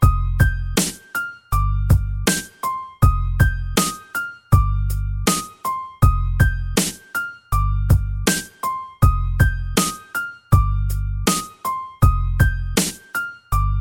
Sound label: Piano Beat